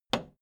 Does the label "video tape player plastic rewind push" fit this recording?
Yes